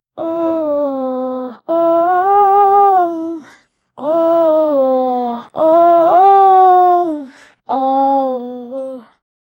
ah ah ah ah ah

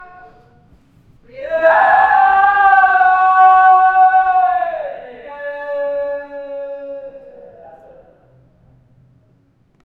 sounds of people crying o, distress

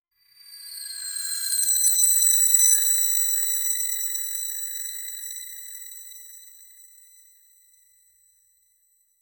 gold dust sparkle hit

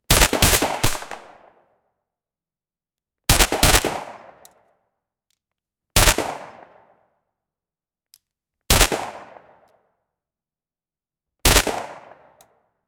gun magazine out mag; mk18

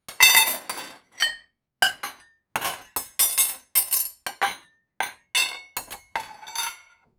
plates and cutlery sounds, dining

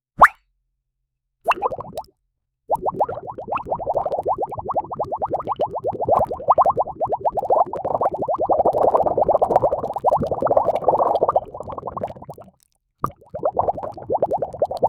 bubbles

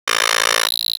sound damage robot

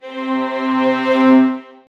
a powerful final note, strings

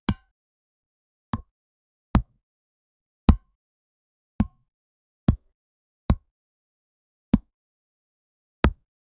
strong whip sound of tennis

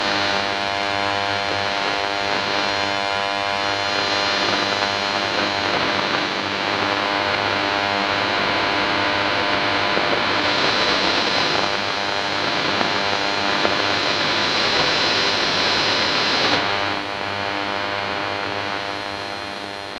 analog radio tuning with

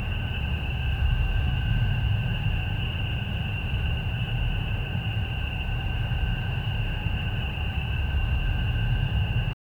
dungeon

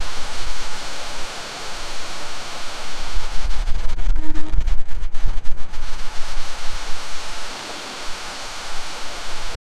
a shrill screech, crunching

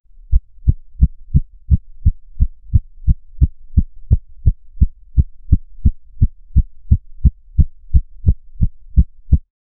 subtle heartbeat

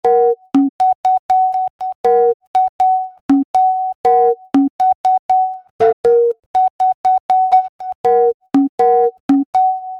simple soothing, ringtone

